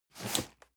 cardboard box medium slide short hard; objekte

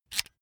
electric drill template adjust heavy in; soundpool

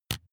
razor fold out hard; maschinen; rasierer

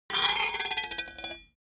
metal rattle spin down; industrie wirtschaft; metall

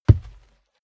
step barefoot wood plank single trample; schritte; Footsteps

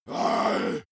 monster growl attack deep; kreaturen

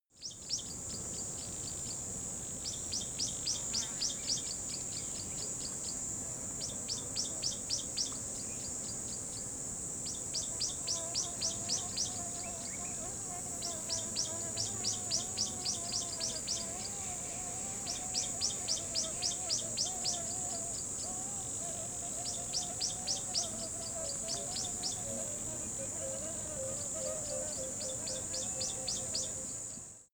river day insect, dschungel regenwald; atmosphaeren umwelt

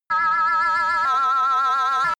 crazy scientist, energie

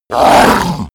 orc attack

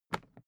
briefcase plastic pick up; industrie wirtschaft; bueroarbeit

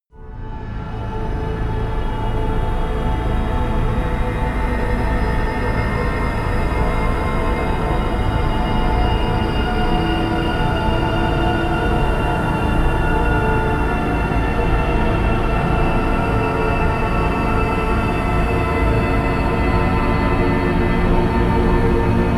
drone whirring chaos

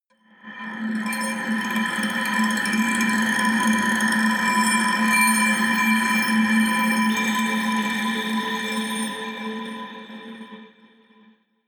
glass ring rattle high, drones; musikelemente

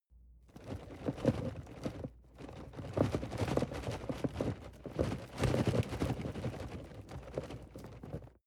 cardboard box medium shake soft long; objekte

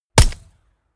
gun shot silencer designed realistic; schusswaffen

